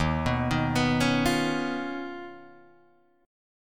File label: Eb 7th Suspended 2nd Sharp 5th Eb, Guitar Drop D; down